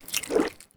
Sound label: spider alert; granny; zerosiki; slender